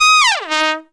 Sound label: TRUMPET FX; Zero-G; Phantom Horns